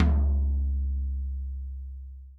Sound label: TOM, Real Drum Kit, Rock Kit; Best Service ProSamples vol.43 - Real Drum Kits